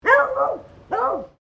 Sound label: ANIMAL DOG BEAGLE FEMALE BARK; Various domestic dogs; Dogs (Sound Effects:Animals)